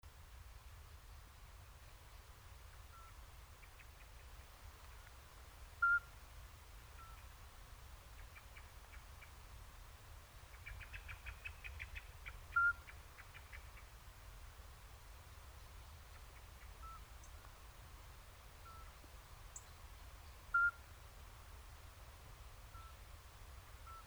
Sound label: Soundscape